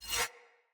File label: Dagger Whoosh Accent; Weapons; Swords